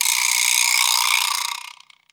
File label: vibraslap; Misc Percussion